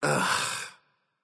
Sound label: ugh; smite; voicepack; mercury